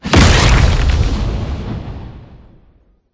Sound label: Meteor Sub Impact